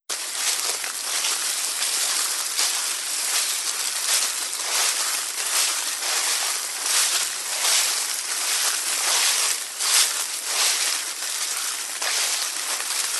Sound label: Footfalls In Leaves; footsteps